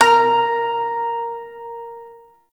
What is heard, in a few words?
Shawzin Oct Two A Sharp; Warframe